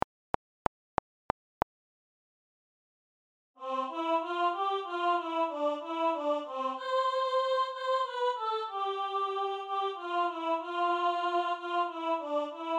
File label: Windy Nights abridged Alto